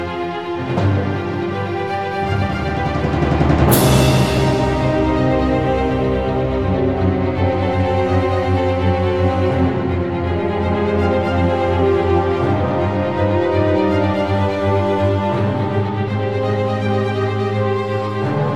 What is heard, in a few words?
Castl Orchestral; SMW